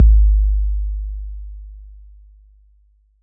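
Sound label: bass drum 808 Decay E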